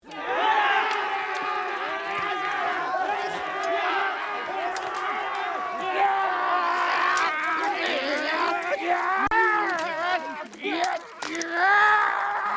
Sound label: japan war-cry